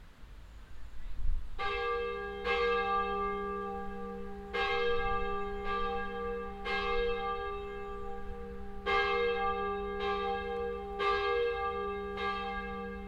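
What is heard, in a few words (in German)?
altes Geläut d fis gis, ev. St. Fabian und Sebastian; Bad Schwartau-Rensefeld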